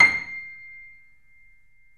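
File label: C6, Bösendorfer, 3D Pianos; E-MU Producer Series Vol. 5; 3-D Audio Collection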